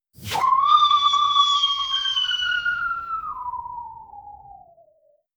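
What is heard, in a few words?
funny cartoon ghost swoosh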